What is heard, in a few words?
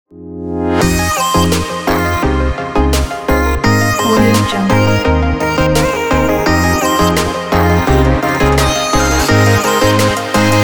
future bass background